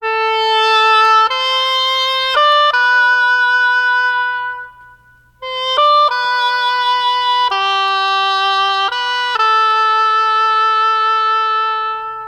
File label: sax chase, sad; loops